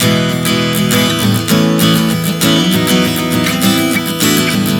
E-A-B-C 100 bpm, acoustic guitar chord progressions